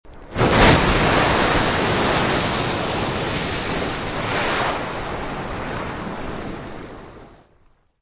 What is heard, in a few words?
Geyser